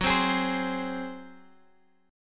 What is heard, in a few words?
F sharp Suspended 2nd Flat 5th, Guitar Drop D; chordshape; down